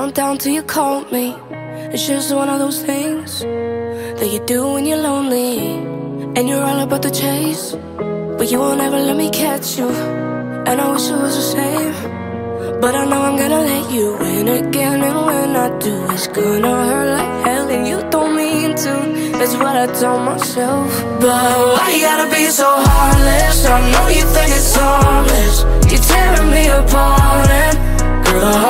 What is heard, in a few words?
English Ringtone